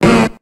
Grito; Snorlax

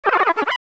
Grito de Turtwig

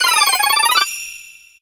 Grito de Chingling

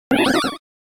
Grito de Mantyke

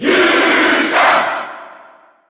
Grito del público, Japones; Heroe; SSBU

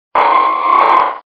Grito de Armaldo

